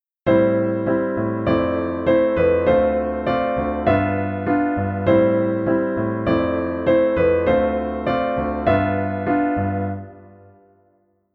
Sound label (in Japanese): 進行の起伏 Am7 FM7 Dm7 G7, piano